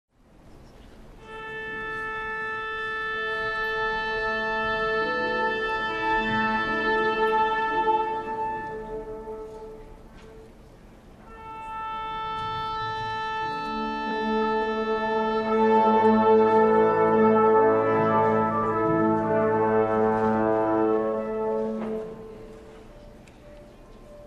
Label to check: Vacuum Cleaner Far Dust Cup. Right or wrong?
Wrong